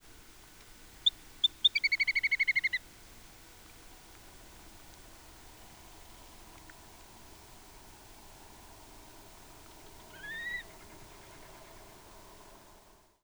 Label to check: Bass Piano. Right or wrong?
Wrong